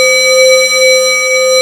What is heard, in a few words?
BELL C4